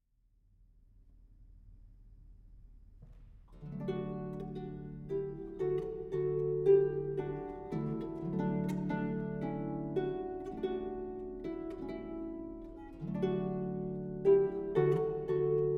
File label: Harpist; Ode To Joy